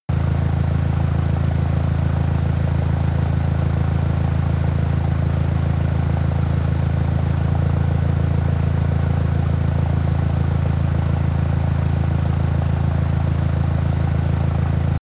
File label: engine slow idle